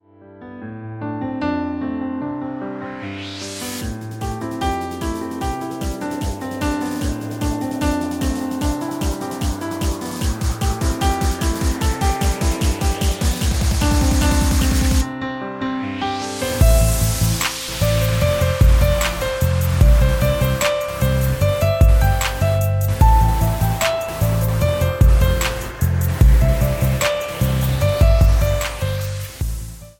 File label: Pop electronic music, ringtone; Spring scenery